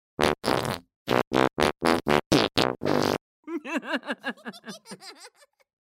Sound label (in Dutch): muzieknoten fragment; efteling